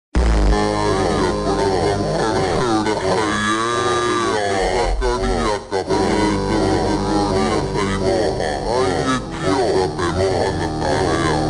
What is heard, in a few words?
Phonk Super Slowed